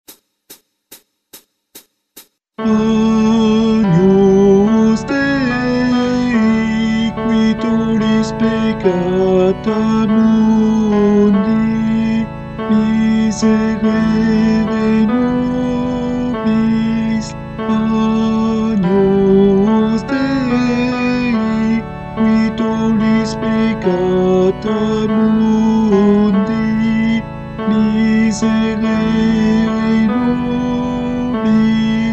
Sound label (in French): Agnus Guide Chant Tenor